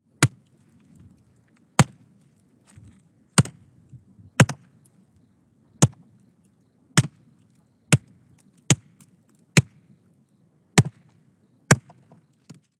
Axe Chopping Wood

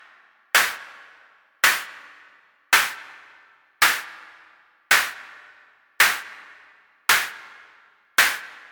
Clap

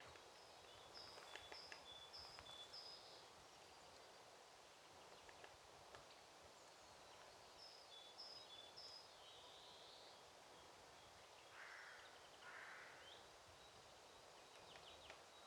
Forest Ambience

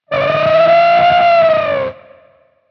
Elephant Sound

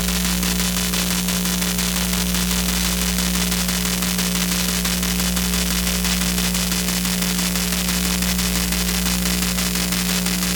Cable Modem Uploading

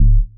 Sub Bass Stab D

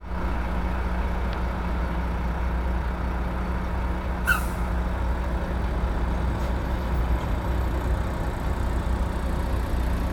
Big Truck Brake

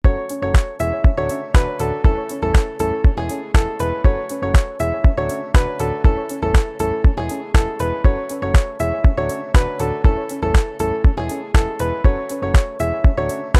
Inspiring Music For Celebrations And Victories

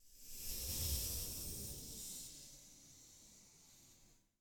Long Swoosh

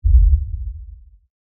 Subbass Hit

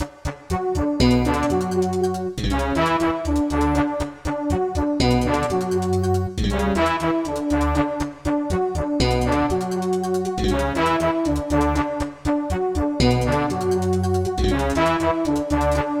Crossing Bass Brass Loop

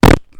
Raspberry

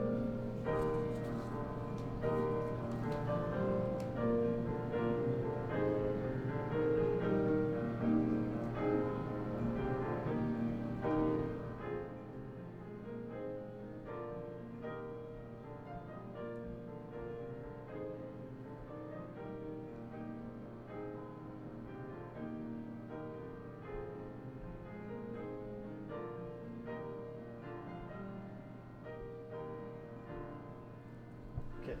Piano From Outside Room

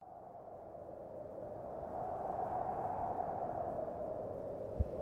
Long Airy Whoosh

